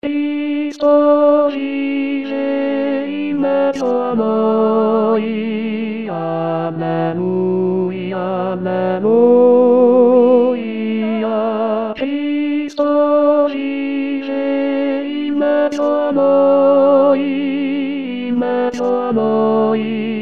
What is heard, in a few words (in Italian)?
tenori, voci guida; corali in vaticano 2024